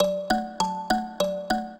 trio, mbira; Mozart

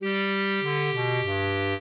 clarinet; Mozart

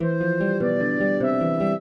trio, flute harp; Mozart

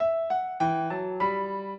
piano; Mozart; minuet

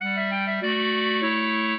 clarinet; Mozart

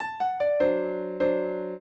piano; Mozart

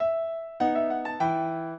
minuet, piano; Mozart

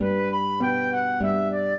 minuet, flute harp; Mozart

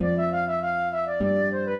trio, flute harp; Mozart

